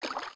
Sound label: Steps Deep Water; Zelda; OOT